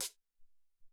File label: SR16 Hat, Alesis SR-18